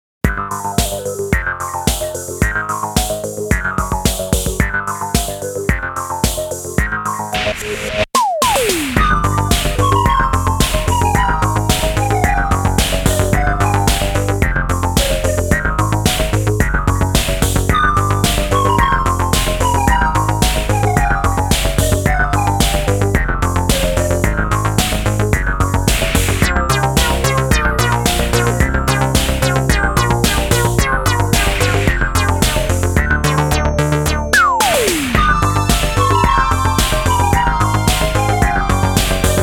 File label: bamboo disco